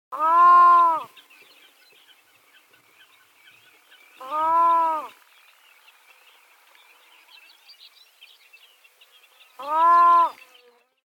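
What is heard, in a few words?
black-throated loon voice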